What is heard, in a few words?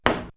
Pong, perc fx; X-Static Goldmine